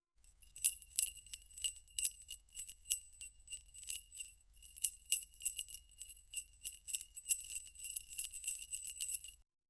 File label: slight jewelry movement, jewelry